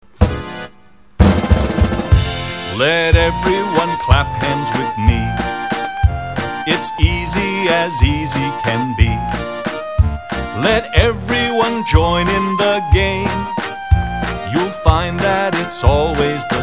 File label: Clap Hands